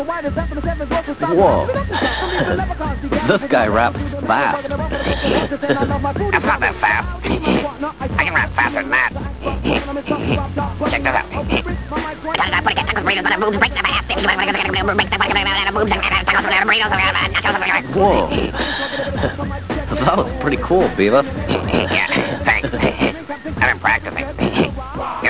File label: rap